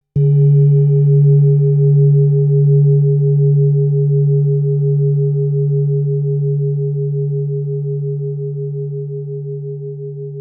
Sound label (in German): Klangschale mars, 1004 g